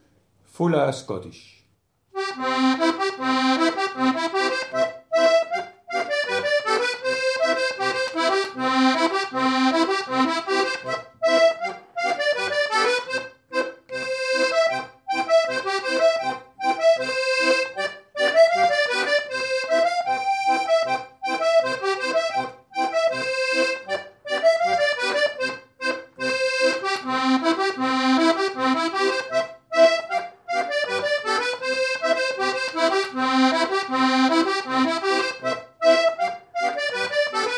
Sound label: fula schottish; schottisch